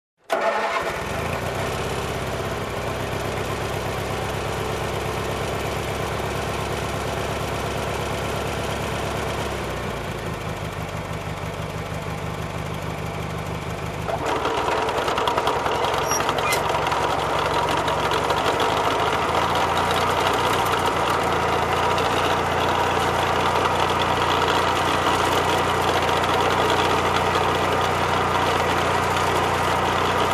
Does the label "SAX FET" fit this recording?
No